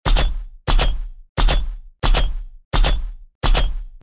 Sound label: Robot organic cyborg footsteps walking